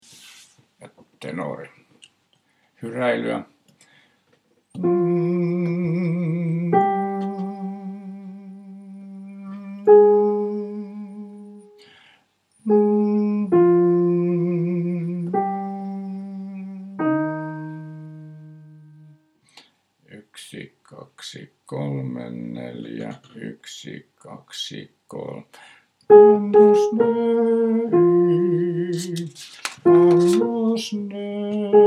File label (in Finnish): tenori; Pie Jesu; mieskuoron